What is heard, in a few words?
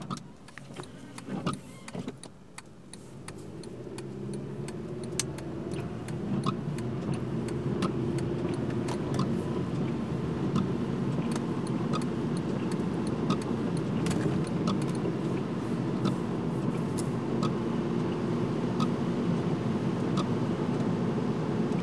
Driving At Night In Rain With Blinker